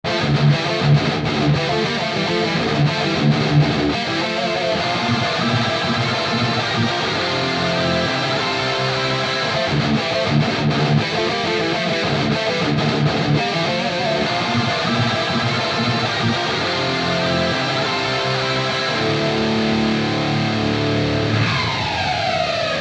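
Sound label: guitar axe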